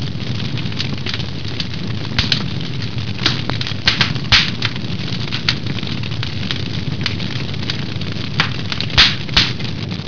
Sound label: fire